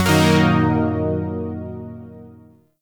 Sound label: Shawzin Blues Chord G; Music; Warframe; Zariman Shawzin